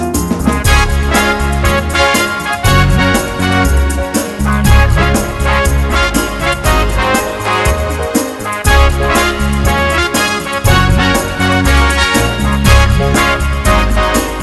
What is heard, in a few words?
Pretty Stride